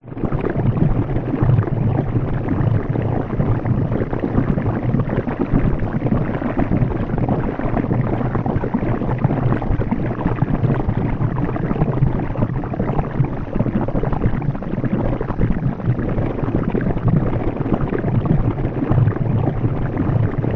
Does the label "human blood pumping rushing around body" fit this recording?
Yes